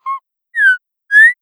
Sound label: Dilophosaur